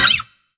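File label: Roland JV-2080 Guitar Fret Noise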